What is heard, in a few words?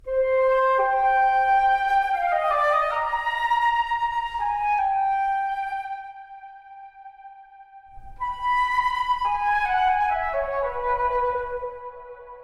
Nocturnal Flute; World of Warcraft; Shadowlands